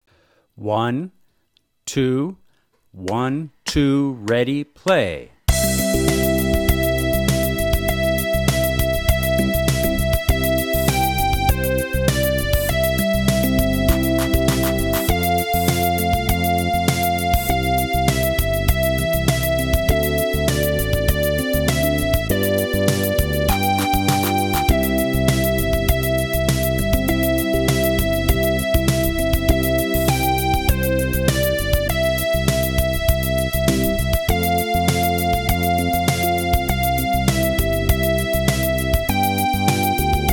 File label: Jingle Bells, guitar